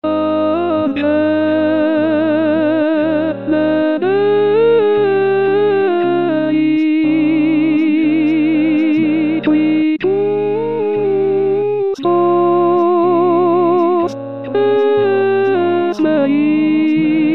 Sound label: oboe sopr